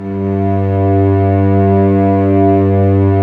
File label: STR, Vcs mf Amb